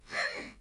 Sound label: hmm; amy rose